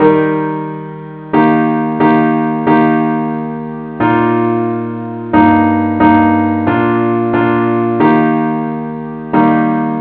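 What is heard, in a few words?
parts only key e flat; henley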